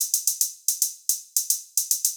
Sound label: Hats 110, Perc Loops, Old Skool House